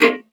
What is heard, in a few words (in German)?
KLAPPE; Xsample VOL-3; STACC+FX